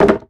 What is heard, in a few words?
Rck Wood Hit; moul-assets